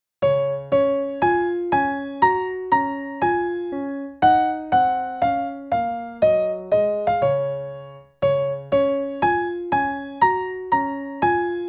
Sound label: Twinkle in Db; scales